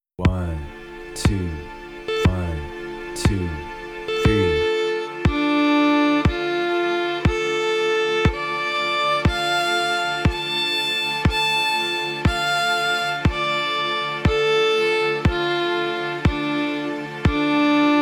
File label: minor triads D Minor Single Bows, fiddle; scale central